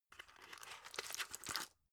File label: drug pull out; arzt